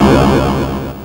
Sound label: Atari Noise Long, Atari 2600; 8-Bit; Chiptune; FX